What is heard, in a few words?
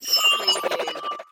Multimedia Roll Over; sound-effects-bible